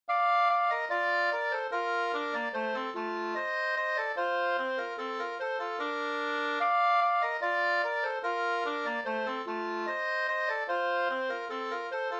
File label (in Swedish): anglas